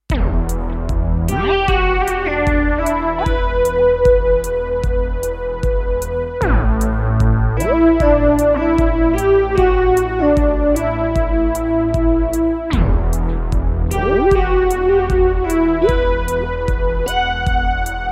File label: Synth Drums